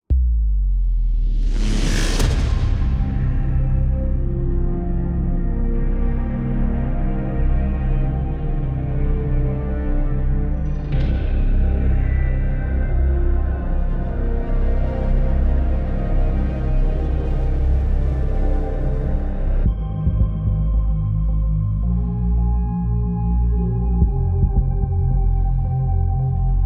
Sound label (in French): Action Militaire